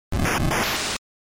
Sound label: Encounter, NES RPG